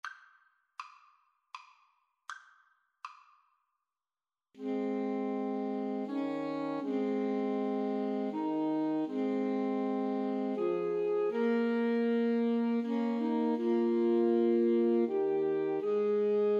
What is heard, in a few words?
Away in a Manger, Alto Sax Trio